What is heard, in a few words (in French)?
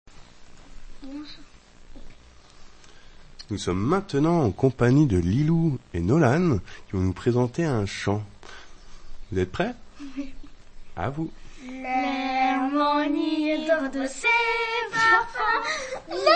Chant